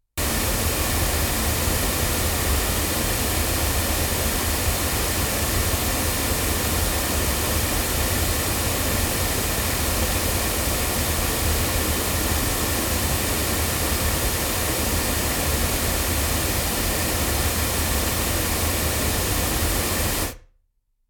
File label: Rode NT2 cardioid loudspeakers